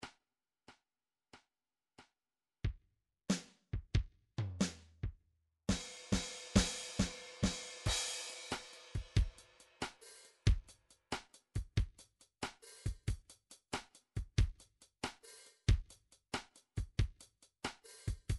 blues

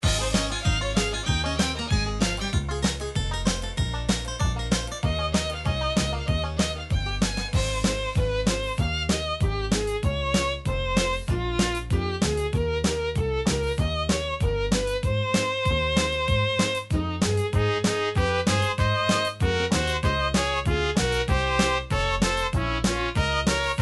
bluegrass, midi

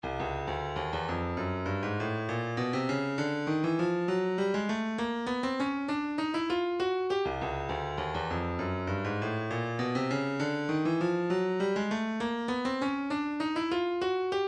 room, midi

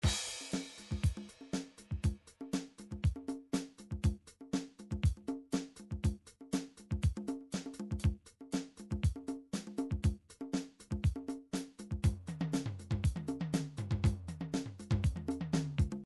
midi drums

